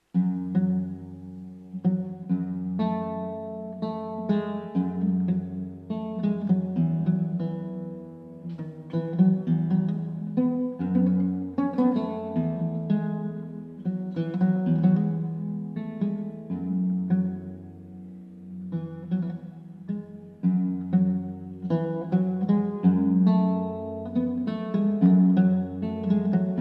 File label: Oud; Palastinalied